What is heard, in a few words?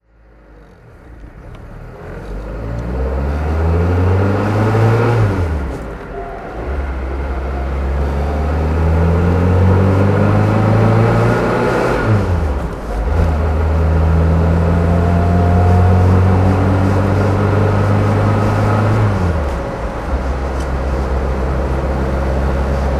mercedes benz 190sl driving; Mercedes-Benz 190 SL, 1961; Engine sound driving; V3D/ ClassicarImages; Marc Vorgers